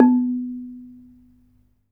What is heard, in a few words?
RANAT THUN B3; Spectrasonics; Heart of Asia